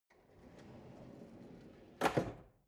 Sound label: drawer narrow close